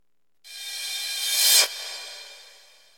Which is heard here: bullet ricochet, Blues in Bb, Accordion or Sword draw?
Sword draw